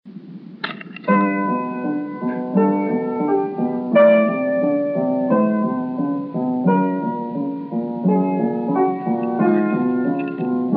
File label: piano